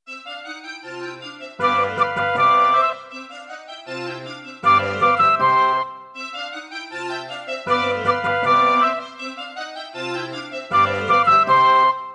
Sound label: Roland Edirol SD-20 GS MODE; classical; Mozart; Concerto K467 partial